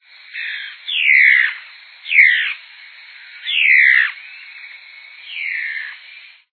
mae-de-taoca-de-garganta-vermelha

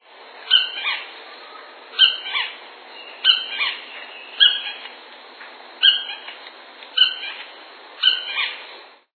joao tenenem castanho

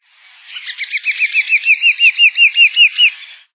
caneleiro-verde

